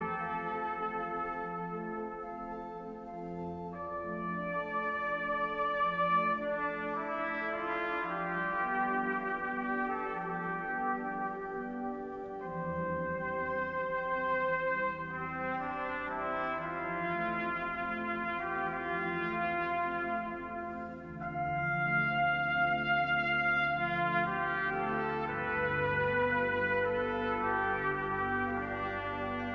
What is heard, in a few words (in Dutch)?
Trompet